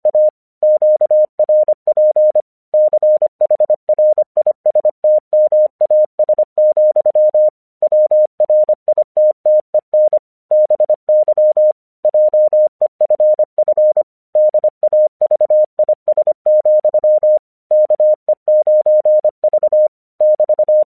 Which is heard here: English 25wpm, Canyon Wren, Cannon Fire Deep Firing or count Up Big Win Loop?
English 25wpm